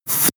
air pressure release

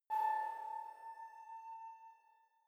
sci-fi facility ai awake

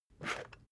water boiler pick up slide

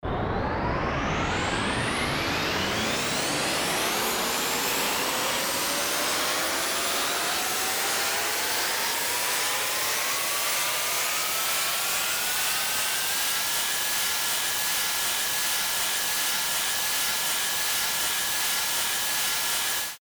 startup engine element

